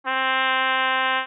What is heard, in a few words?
trumpet